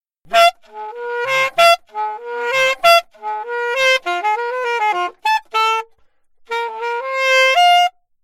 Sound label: altosax